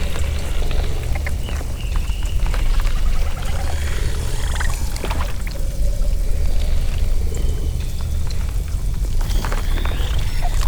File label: vivarium idle; Stellaris; grand archive